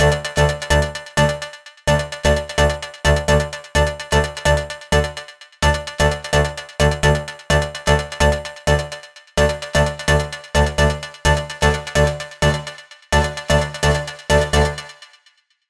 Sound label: Lead Synth D